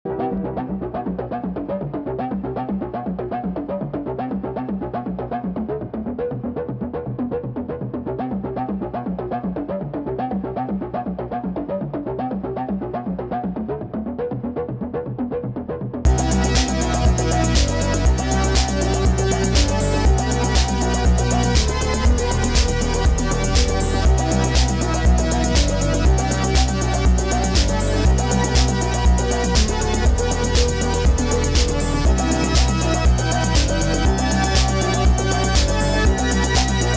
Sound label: Retro Dance Loop